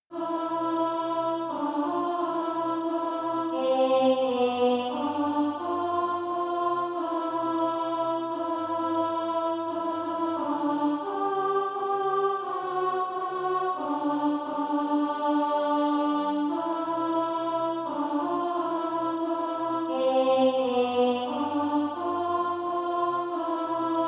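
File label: alto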